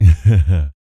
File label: LAUGHS, Jazz Fx, Vocal